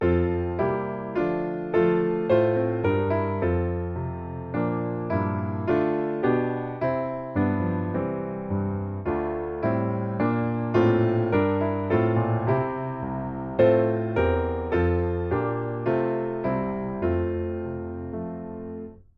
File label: piano